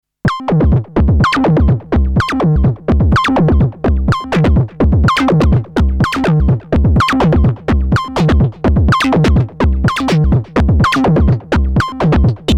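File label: ASM Hydrasynth Rhythmic Bass Arp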